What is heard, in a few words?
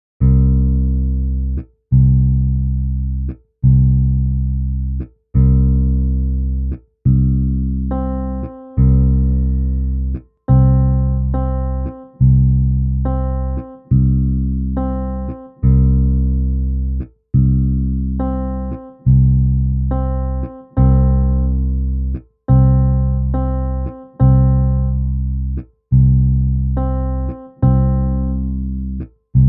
C Drone Half Quarter Rhythms 70BPM; reading notes rhythms plus ear training